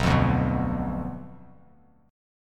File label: B Diminished B down Bass Standard; Bdim strummed